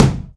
dry steel kick drum sound d key, bass drum sound tuned to the D note